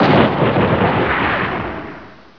EXPLO; The Incredible Demo; Tim

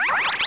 POWER UP; bugs

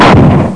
fire

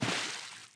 wet step; DAoC

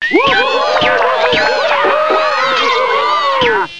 Bug Fun crowd; How Many Bugs in a Box

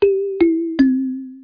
pig; TimeBlock

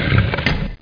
SWITCH; chasm

